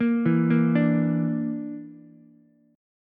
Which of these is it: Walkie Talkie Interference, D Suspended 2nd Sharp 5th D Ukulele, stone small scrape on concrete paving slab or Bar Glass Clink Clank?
D Suspended 2nd Sharp 5th D Ukulele